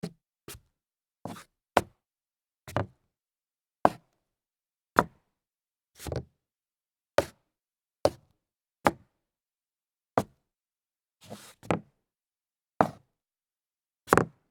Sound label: scuff hollow wood with heels